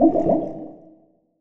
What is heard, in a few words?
bubble